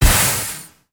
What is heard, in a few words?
Full Steam Ahead Pulse